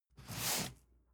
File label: cardboard box medium slide short; objekte